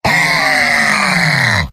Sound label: cheers; heavy; video games; source engine; tf; mvm